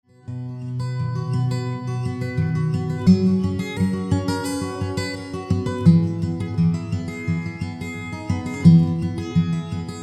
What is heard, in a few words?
Soft Trees